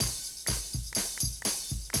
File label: 125, Classic Drum Loops